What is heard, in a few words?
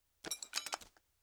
Tool Grab; Fairlight Sound Lib